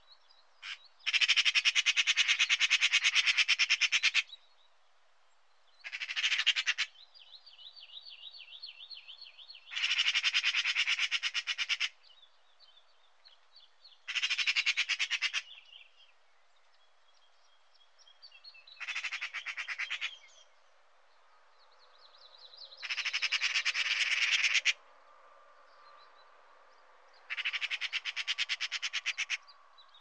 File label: pica pica